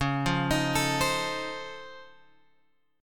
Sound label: Db Augmented Major 9th, down, Guitar, Open F; arpeggiated; JGuitar